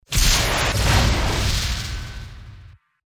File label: spell fireball effect; baldursgate